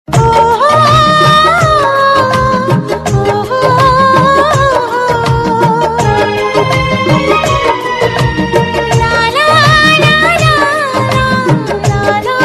Instrumental Title Tune; Saath Nibhaana Saathiya

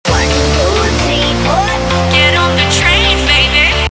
Mashup SMS Tone; Bollywood; Chennai Express

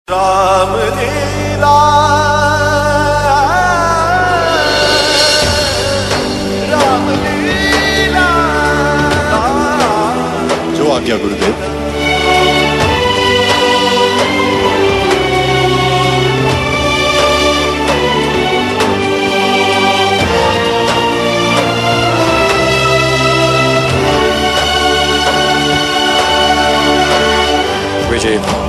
Tune With - BG Score; TV Serial Tone; Life OK; Ramleela Ajay Devgn Ke Saath

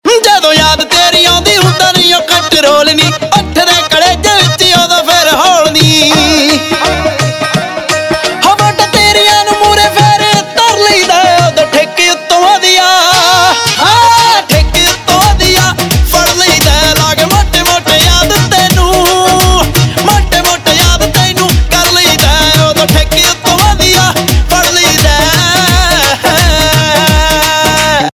Ringtone, Punjabi

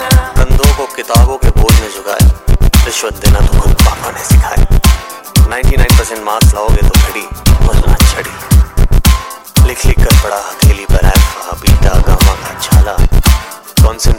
hip hop mix ringtone; 3 idiots

